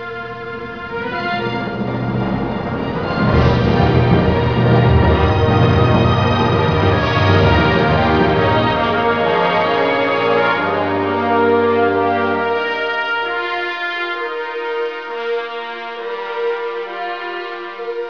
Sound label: victory; h2siege